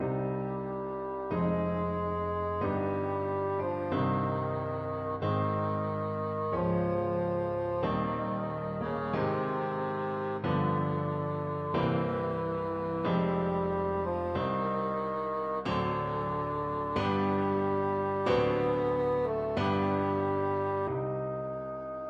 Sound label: chopin prelude, bassoon